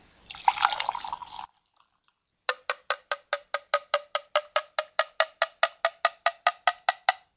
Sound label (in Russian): nalili vodu i postuchali gorlishkom plastikovoj butilki po kruke